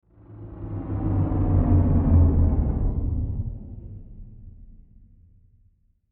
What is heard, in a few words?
GHOSTLY WHOOSH, Ghostly Whoosh Descend; Whooshes; Production Element Imaging Element Accent Transition